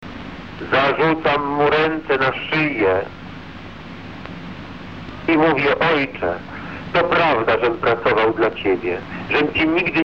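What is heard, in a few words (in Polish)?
zakłócenia pasmo rządowe 50575MHz; zaklocenia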